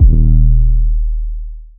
Bass

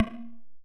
percussion; bespoke - Floral v.1

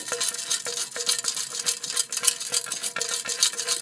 Hollow metal jingling, Foley; SFX; 7rystan's 600+ FREE EDM SAMPLES & DRUMS

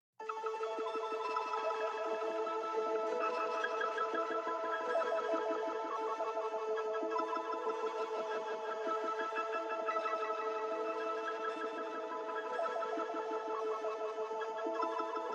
pad first rain a maj; synths pads; base pack

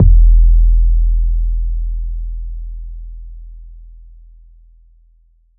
Trap bass, EDM

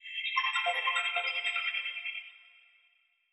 glitch F maj; fx; bespoke - Floral v.1

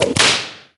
Classic whip; SFX; One shot; 7rystan's 600+ FREE EDM SAMPLES & DRUMS

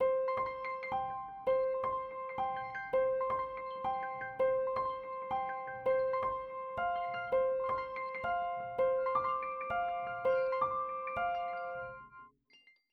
granular piano Cmaj; bespoke - Floral v.2

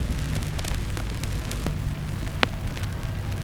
Vinyl; 7rystan's 700+ FREE COLOSSAL EDM SAMPLE PACK

